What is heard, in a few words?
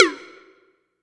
Perc